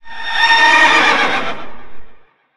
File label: Enter Play